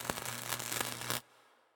betline Bolt; netent; wishmasteroct

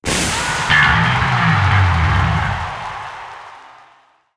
bonus game bass; netent

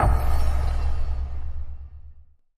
spin Button Click; netent; magicportals

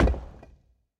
block Block; netent; pyramid

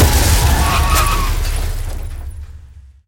big Win Start; netent; reelsteal

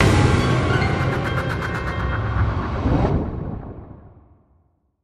intro Free Spin; netent; bloodsuckers

